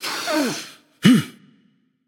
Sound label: nv breath; smite; xbalanque